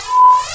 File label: sympathetic, electronic sounds; Sound Effects for Multimedia - Volume 1